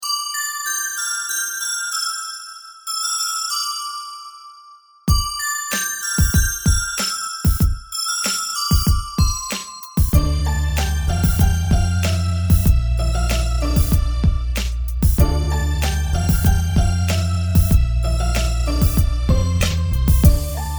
song instrumental

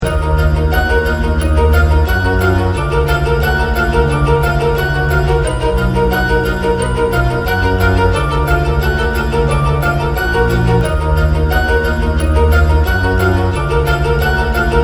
Instrumental

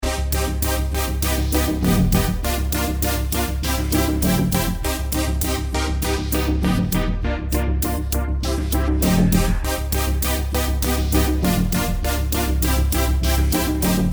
instrumental

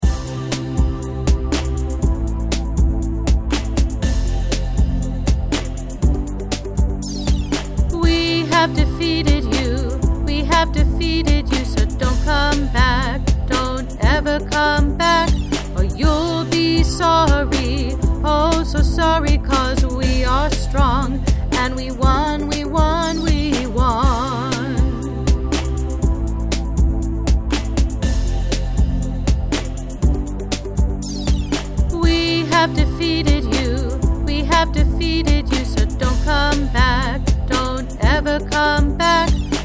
song vocals

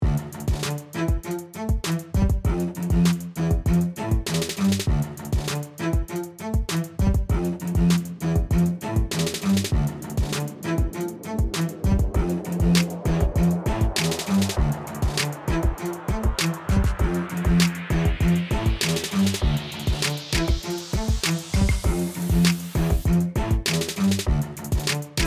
instrumental

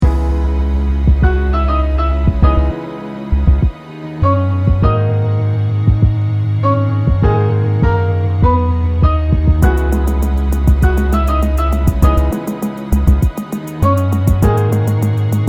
slow speed instrumental

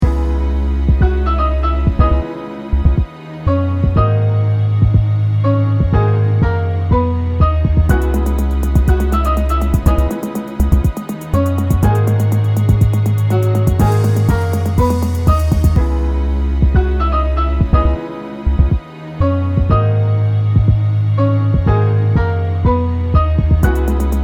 song instrumental

